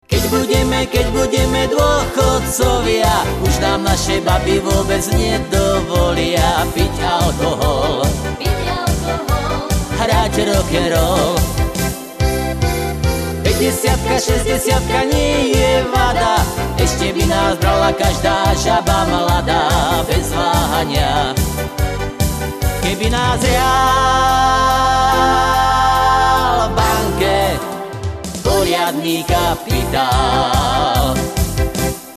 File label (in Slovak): akord